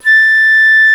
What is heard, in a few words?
Flute A5; Proteus 2000; Digital Sound Factory Proteus 2000